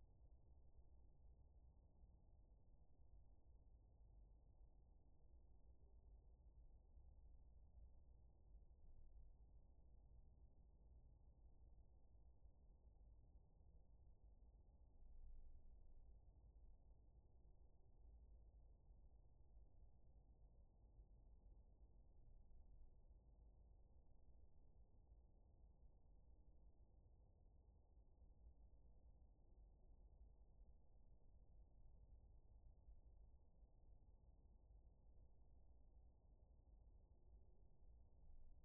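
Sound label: hydrophone; ICLISTEN HF6092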